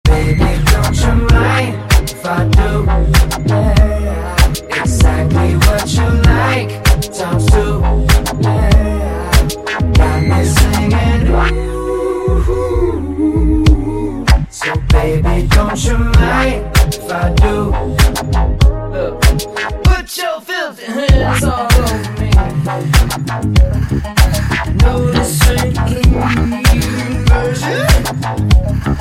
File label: ringtone